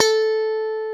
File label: GTR Funky Strat; 90's Sample CDs; Club-50 - Foundations Roland; Funk & Mute